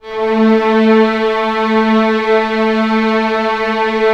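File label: strings A2 FF; Sound On Sound - Peter Siedleczek's Orchestra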